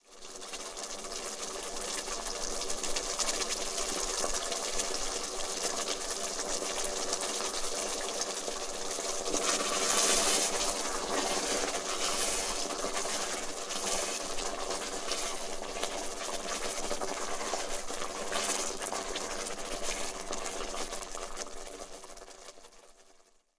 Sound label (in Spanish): cocina organico fuego; sonniss gdc